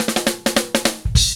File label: FILL, Funky Ass Loops